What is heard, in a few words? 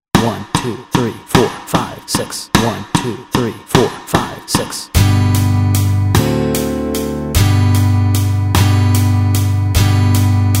Three Blind Mice Chords Track, guitar